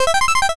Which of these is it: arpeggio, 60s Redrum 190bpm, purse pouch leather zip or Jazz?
arpeggio